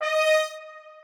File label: strings